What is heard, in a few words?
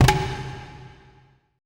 E CGFLAME, ETH DRUMS